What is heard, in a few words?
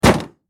Car Crash; sfx; teststrecke game